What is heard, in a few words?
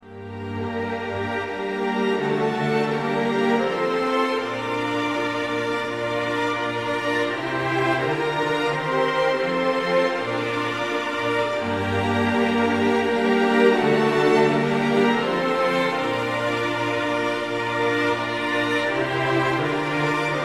Capriol Suite for string orchestra, Pavane; Warlock